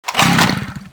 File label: chainsaw draw